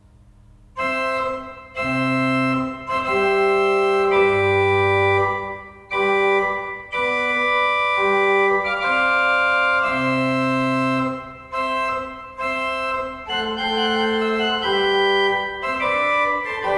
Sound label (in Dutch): Cornet Voluntary, orgel; Wapserveen; Drenthe; Travesr